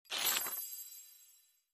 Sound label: charm book scroll over; princess